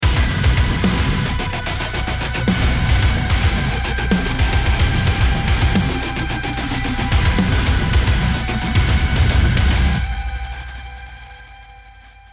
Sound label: bomb ten sec count